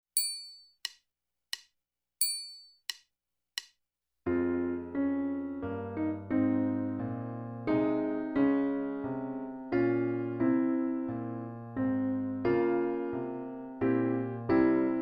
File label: Minuet, Trb, Bar